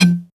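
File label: shells eject tube, super shotgun; weapons; doom; ssg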